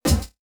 Multimedia Internet CD-Rom Flash Buttons Short

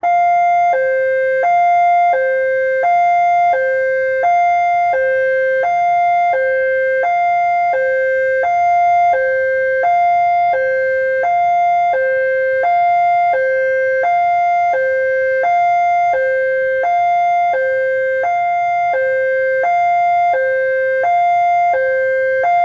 Emergency Siren Police High Low In City From Side; emergency effects; AdobeCC

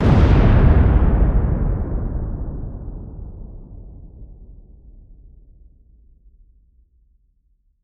Explosion Demolition Large Deep Blast Dynamite; Fire and Explosions

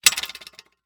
Foley Metal Pole Cinder Blocks Rattle Quick

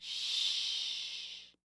Human Female Shushing

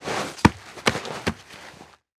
Impact Hand Dig And Slap In Dirt

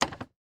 Digital Digital Button Click; Multimedia Internet CD-Rom Flash

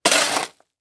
Industry Tool Shovel Dig In Leaf Debris Quick

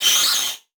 Multimedia Internet CD-Rom Flash Wipe

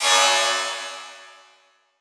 Multimedia Internet CD-Rom Flash Beep Mechanical

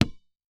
Sports Basketball Single Bounce On Hardwood Floor

